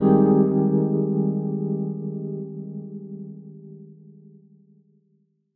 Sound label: Shimmer Keys A flat maj